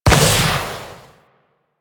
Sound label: Vial Gas Shatter; Warframe; DE; Warframes; Alchemist; Elements; Ability